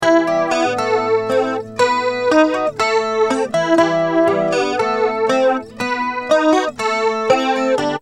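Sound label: acoustic guitar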